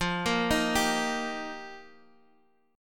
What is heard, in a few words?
F 7th Suspended 2nd Suspended 4th F